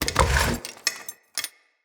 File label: Warrior Statue Bust Pickup